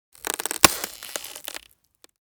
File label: Ice crunch and crack